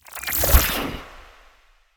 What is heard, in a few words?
Ice Spell Utility Unlocking; Utility Spells; Arctic Aura